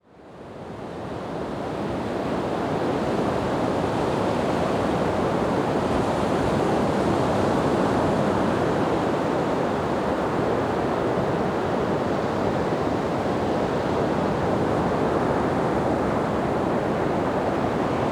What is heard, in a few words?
North Sea Ambience; Biolateral